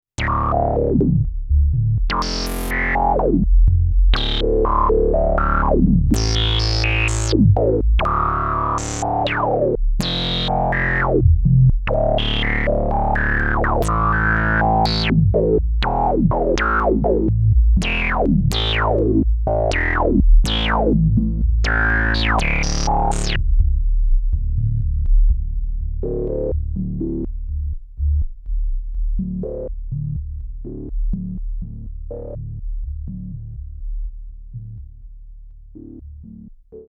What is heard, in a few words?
OBXa blurps